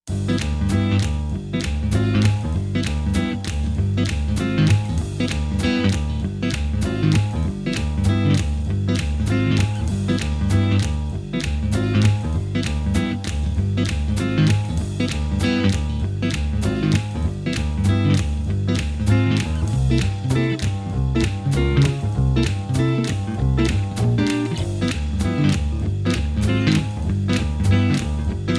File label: guitar swing, acoustic guitar